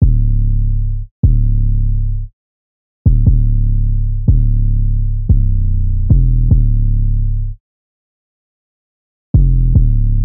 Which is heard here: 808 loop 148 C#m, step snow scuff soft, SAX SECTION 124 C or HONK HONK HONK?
808 loop 148 C#m